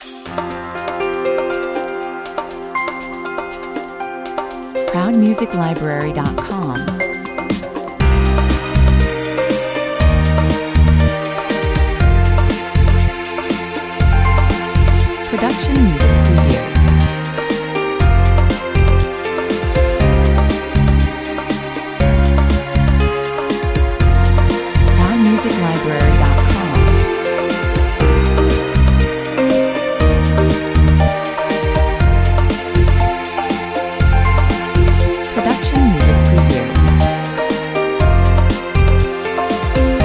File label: string beats 120 bpm